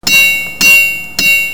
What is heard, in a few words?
rock